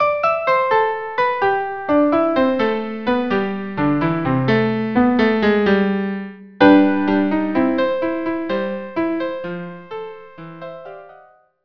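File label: violin viola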